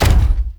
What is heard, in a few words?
Step; Warframe; Corpus; Jackal Redux; Steps